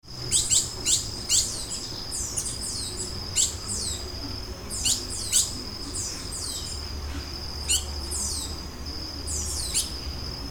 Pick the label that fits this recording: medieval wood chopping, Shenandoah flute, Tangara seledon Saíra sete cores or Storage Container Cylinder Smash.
Tangara seledon Saíra sete cores